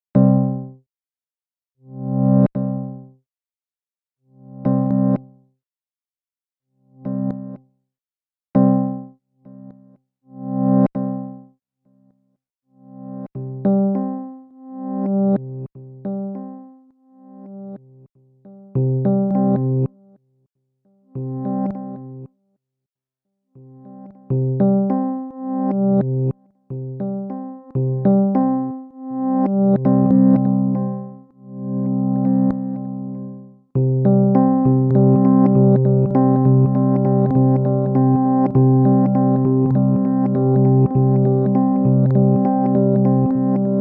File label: single notes